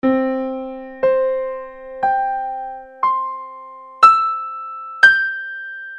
progressione delle sei armoniche

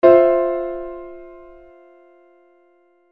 accordo

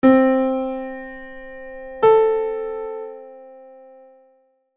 sesta maggiore

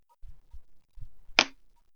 rumore

